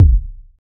hot urban bass drum sound d key, bass drum sample tuned to the D note